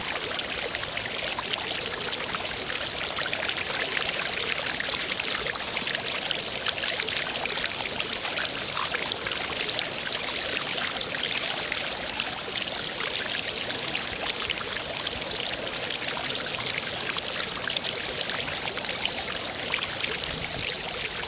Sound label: river med